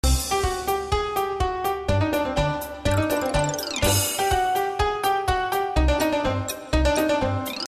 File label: bg music